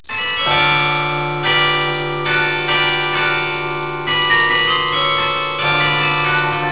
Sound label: carillons, bell; lansing